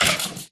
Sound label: hurt, stray; Minecraft